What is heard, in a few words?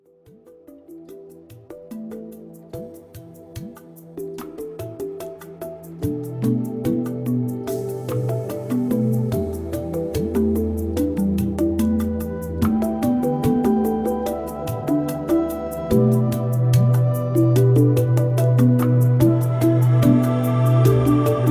African Djembe Drums Beats